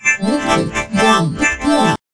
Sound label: jumpscare